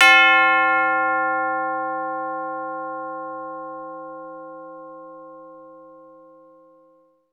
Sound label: CHIME; Roland - LA Composer vol. 1